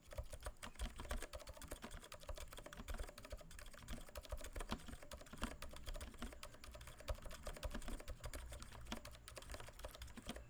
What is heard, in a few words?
cherry silent red; mechanical keyboards